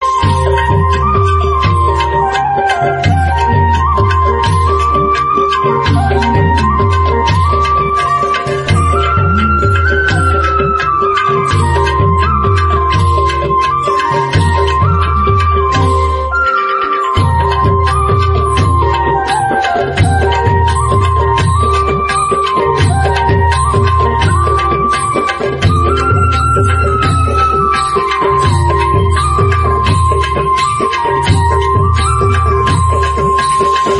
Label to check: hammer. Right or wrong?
Wrong